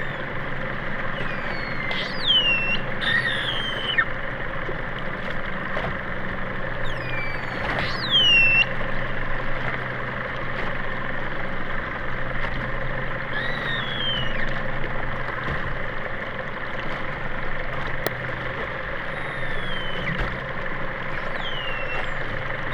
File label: globicephala Melas GLOB SIFFLEMENT